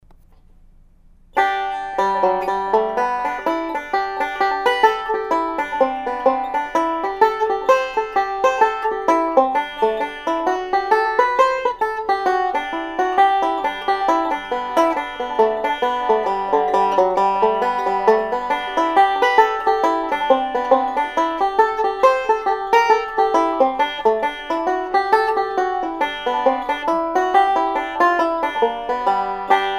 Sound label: G C D7 8th notes